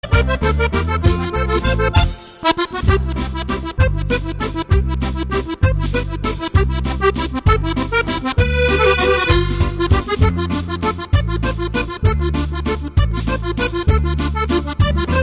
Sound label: Waltz